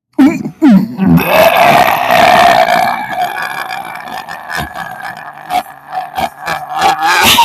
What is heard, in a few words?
the big burp